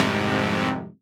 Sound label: Fm Dub Chord